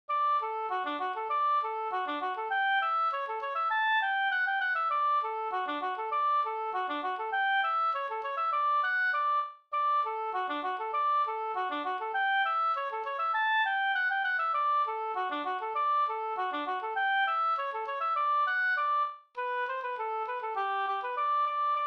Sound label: polka